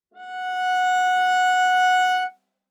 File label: Viola arco ff sul D Gb5 stereo; MIS Pitches - 2014; Strings